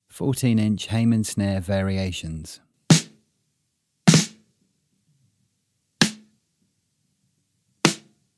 Snare; Hayman Snare

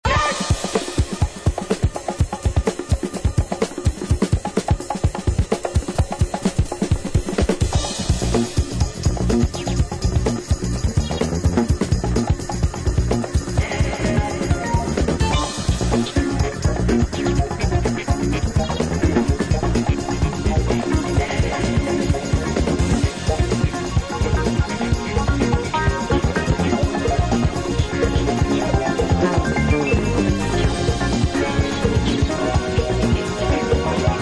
instrumental remix

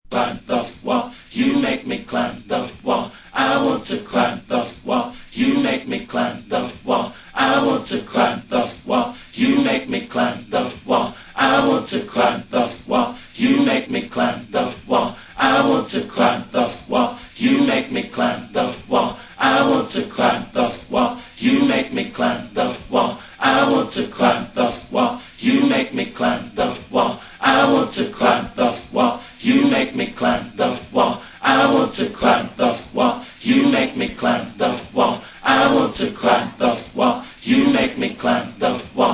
acapella

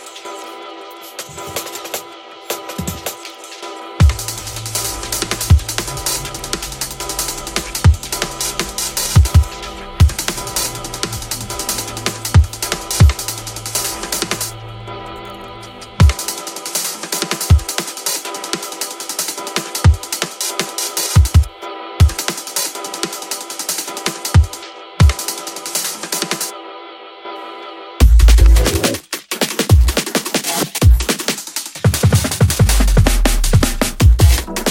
Drumming

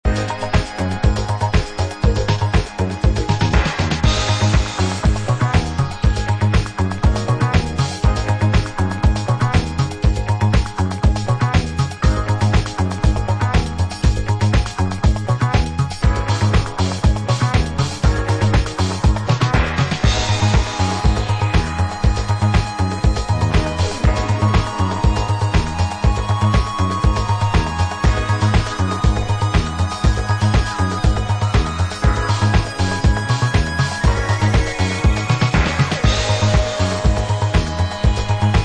Instrumental; disco

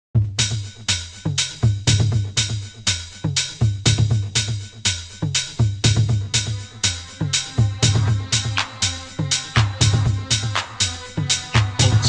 Trance Fusion

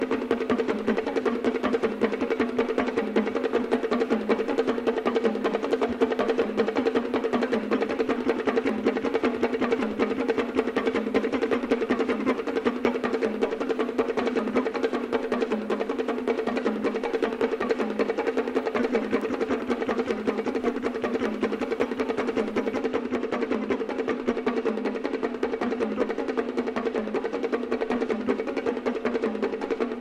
Drumming (part I); Deutsche Grammophon

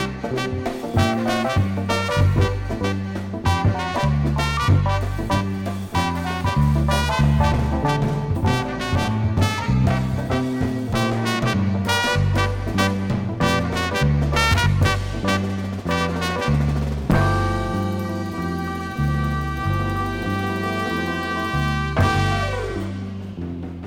Soulful Drums

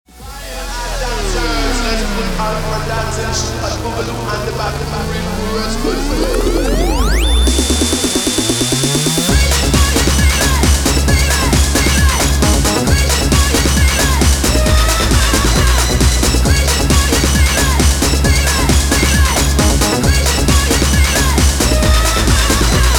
Bleep Crash

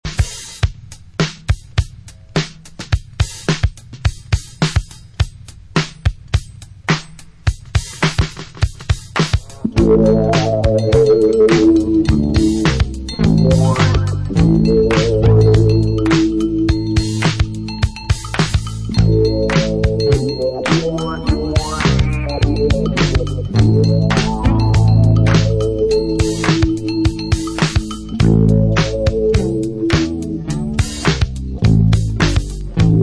cuckoo clocking

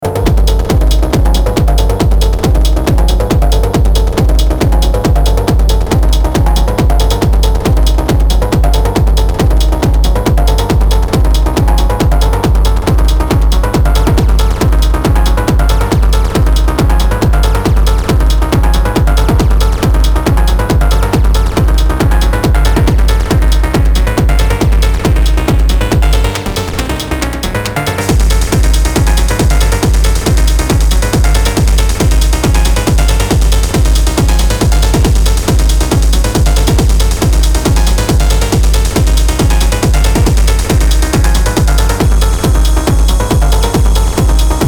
Plastic Horns

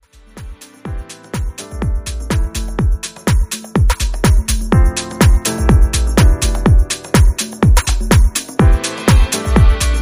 Main Instrumental Mix

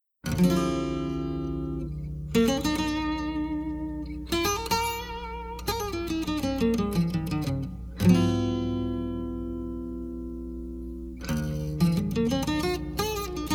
Flute s Sequence

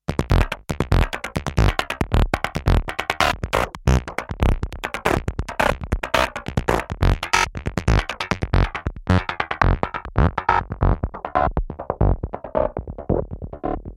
Acid Bell

